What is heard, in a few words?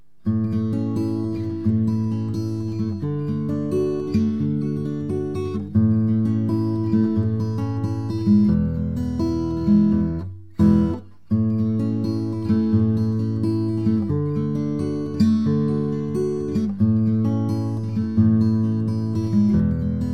OM Guitar; Peter Coombe Mandolins and Guitars